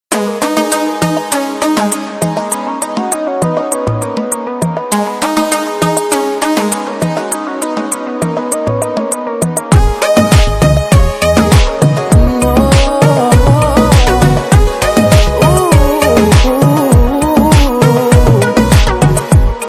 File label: Starting Musik Tone, Telugu; Angry Bird